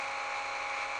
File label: electric motor; outfly